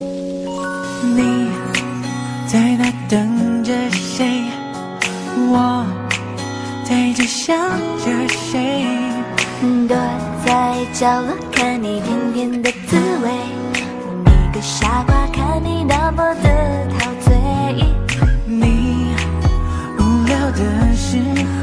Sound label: japanese ringtone